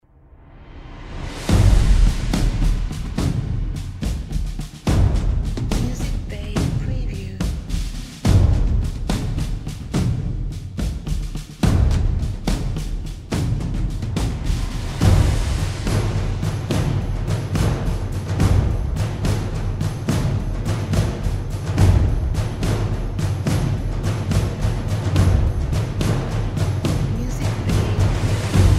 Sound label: Epic Drums of War